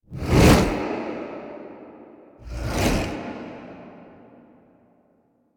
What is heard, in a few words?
Arm Whoosh; Queen; Cinematics; Warframe; The War Within; Queens Lair